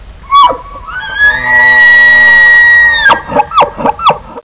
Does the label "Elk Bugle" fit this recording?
Yes